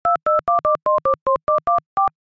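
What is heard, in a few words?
iphone keyboard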